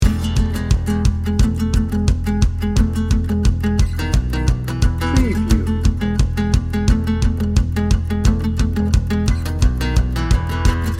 happy face loop

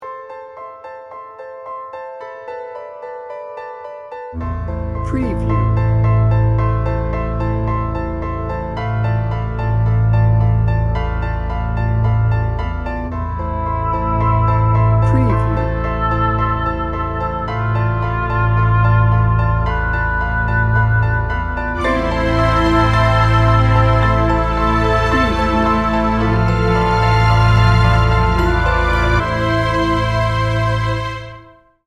cinematic heartwarming dramatic

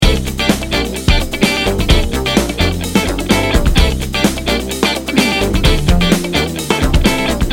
funky bounce loop

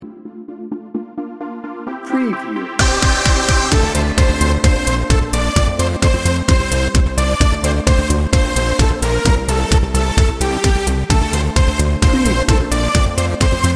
party party edm

